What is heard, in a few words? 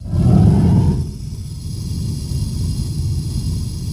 Oven Gas Flame On; appliances